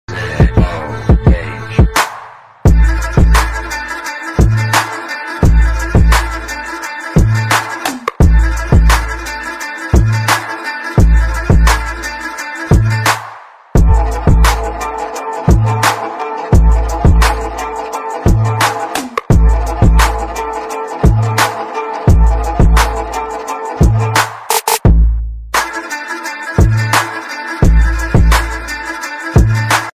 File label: attitude ringtone bgm ringtones english ringtone; bad boy ringtone